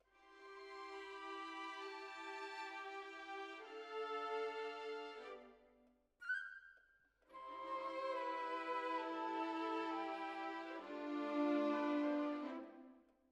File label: Violins, jazz for piano